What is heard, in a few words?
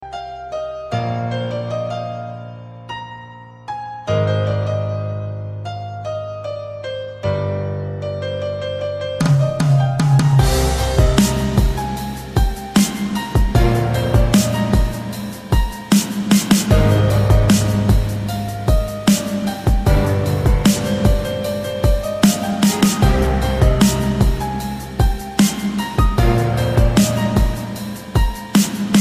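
piano nice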